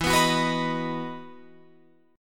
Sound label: E Major 7th Suspended 2nd, Guitar, Open F; EM7sus2 strummed